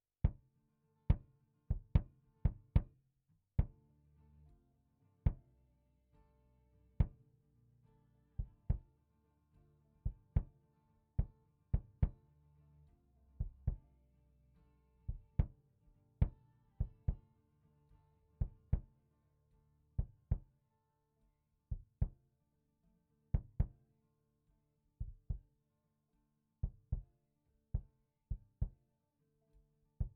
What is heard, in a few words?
Kick